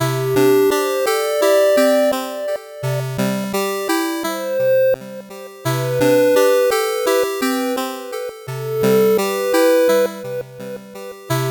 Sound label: 8bit bgm normal